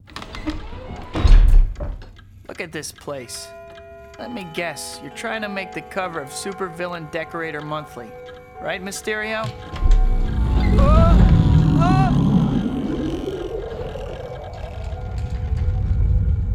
LIVING ROOM START; Spider-Man 2; PS2

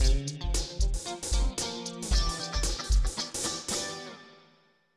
Minimal Jam; Bleach Hanatareshi Yabou; PS2

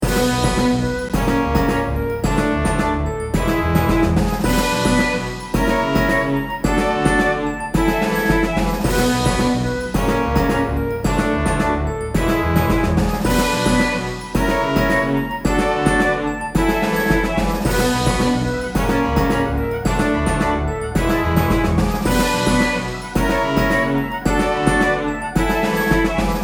Battle (Orchestra); dragon fantasy book i the volumes of westeria

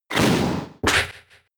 Kick; Rolling Kick

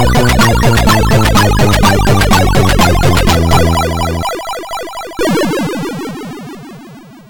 Unknown Jingle (Slow); No Second Prize; Atari ST

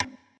glitch tile; gonner linux macos ps4 switch windows xbox one gamerip 2016

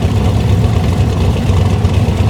Chevrolet Camaro Idle

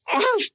inmate pain; Devour

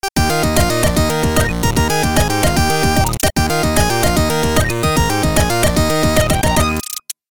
More Urgent Tune; Pacman

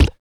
Bullet Hit; A Robot Named Fight; Tumor Bullet Hit

